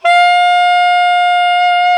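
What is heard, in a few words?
soprano sax F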